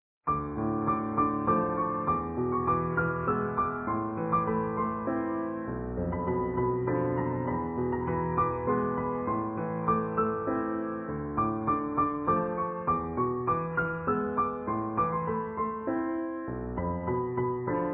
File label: Easy Piano